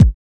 Kicks

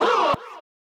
Chants

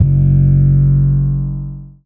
808 F#; Cymatics

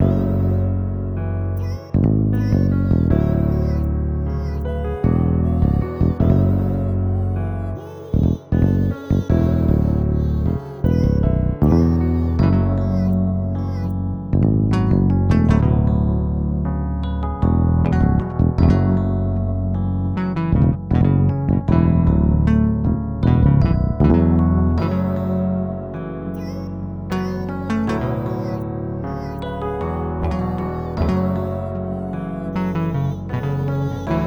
155 melodic loop F#

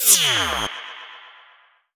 TRAP TRANSITIONZ

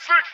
Vox

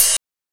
Closed Hats

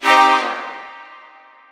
Hit

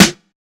SN, Snares

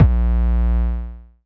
Light Dist 808; 808s

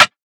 Rim

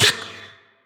Closed Hats

